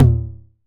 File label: Tom 909 Mid; Drums